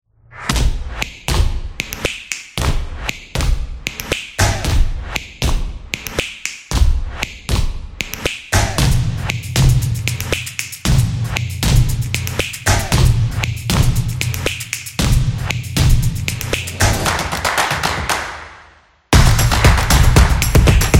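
Stomps Opener, Street percussion background music built for sport, motion, and dance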